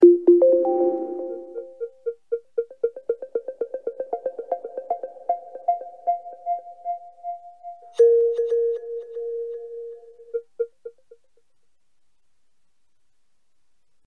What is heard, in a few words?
startup sound jingle; wii u